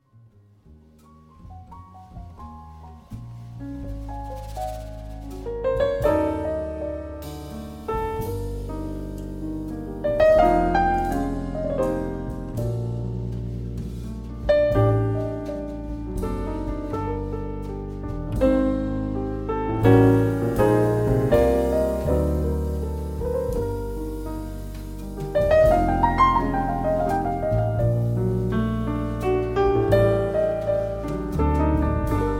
Mazurka G Minor; op posth 67 no 2

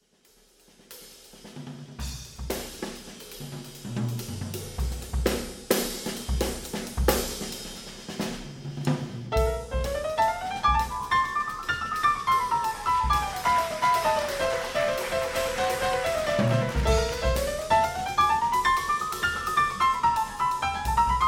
Etude In A Minor

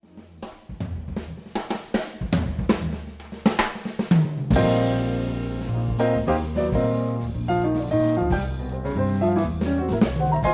Nocturne E Flat Major

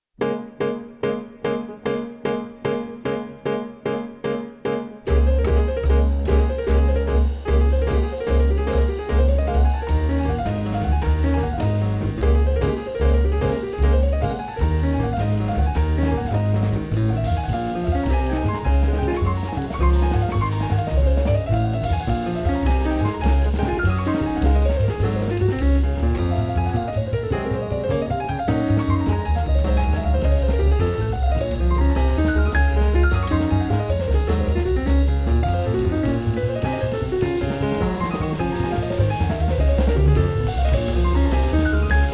Waltz in D flat major

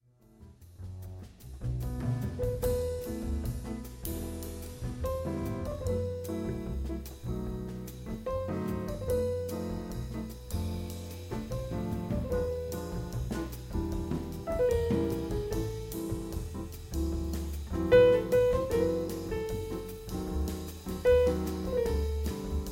Prelude e minor

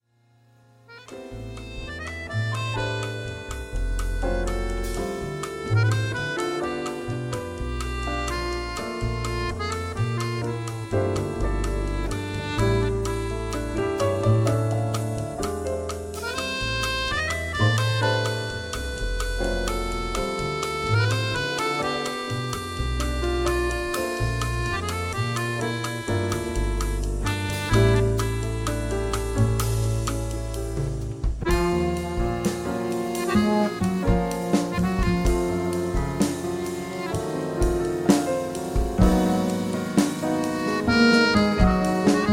blue melody

